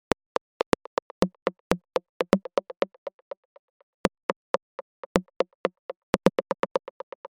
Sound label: 122 BPM Rims; MMB; Percussion Essentials